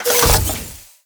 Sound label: Zariman Door Medium Open; Ambience; Gameplay; Warframe; TheNewWar